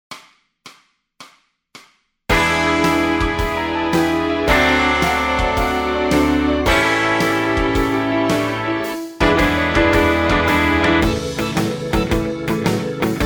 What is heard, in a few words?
bass blues, backing tracks